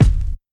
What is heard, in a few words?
Fluffy Kick Sound F# Key, Royality free kickdrum sound tuned to the F# note.; kick drum samples